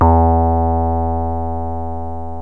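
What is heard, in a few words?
bl square, yamaha rm1x synth bass and lead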